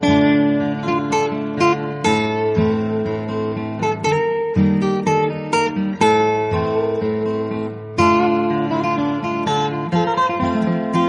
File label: romantic